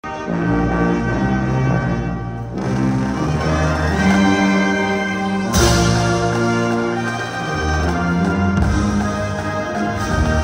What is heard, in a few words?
Sousaphone